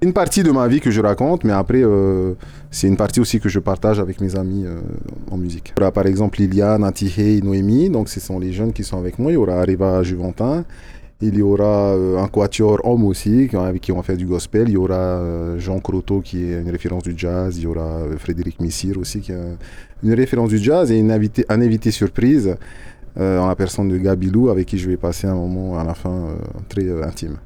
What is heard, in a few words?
Jazz; prepare son premier concert